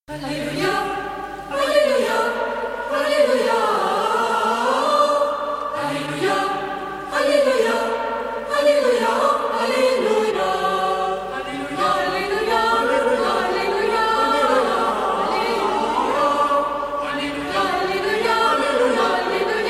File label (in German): Halleluja Kanon